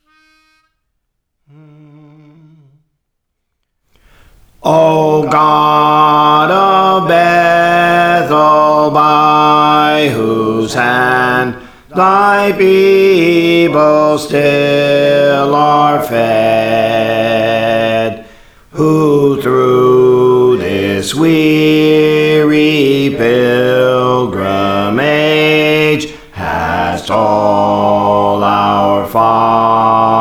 Bass, Songs; Praise For The Lord Training; O God of Bethel

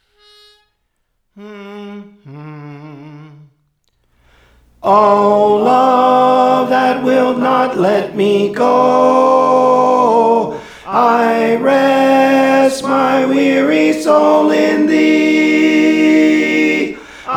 Tenor, Songs; Praise For The Lord Training

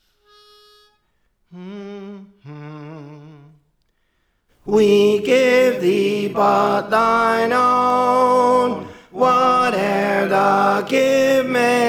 Tenor; Praise For The Lord Training

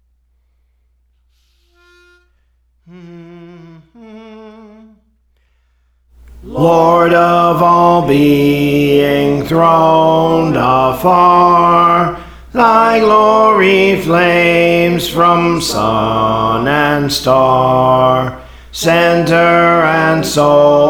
Bass, Songs; Praise For The Lord Training; Lord of All Being Throned Afar

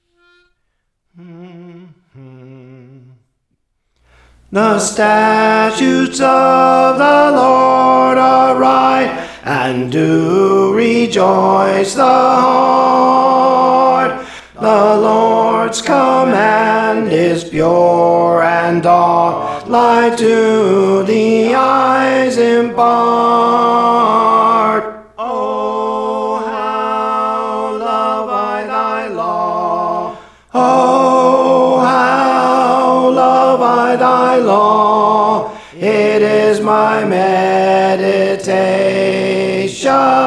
Tenor, Songs; Praise For The Lord Training

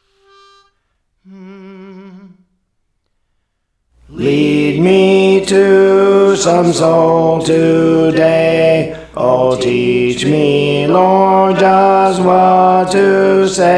Alto, Songs; Praise For The Lord Training; Lead Me to Some Soul Today

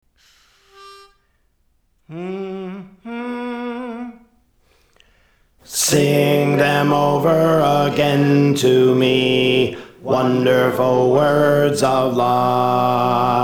Alto; Praise For The Lord Training

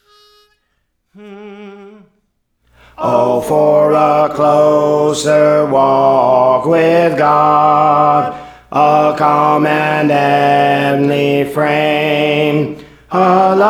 Alto; Praise For The Lord Training; O for a Closer Walk with God

